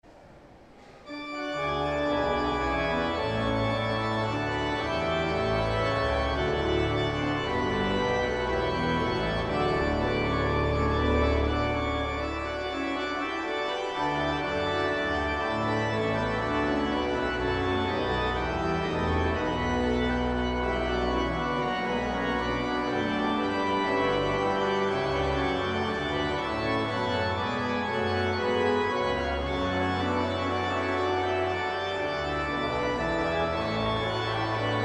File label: Organ, Chorale Prelude; J S Bach; 'From Heaven Above To Earth I Come'